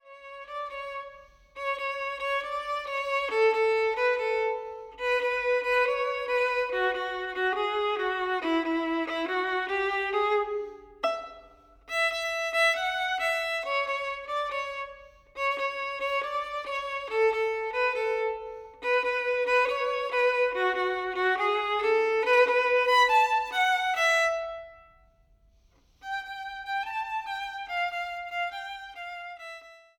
VIOLIN

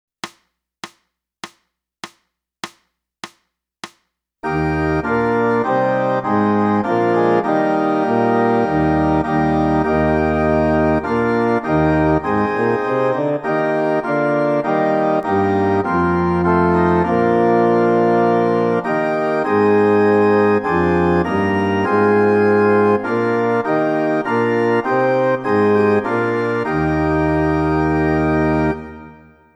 clarinet; boosey method

